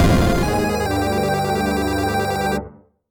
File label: Dun Dun Dun; Clicky Buttons; BeepBox